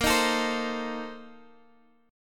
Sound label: Bb Major 7th Suspended 2nd Suspended 4th, Guitar, Half Step Down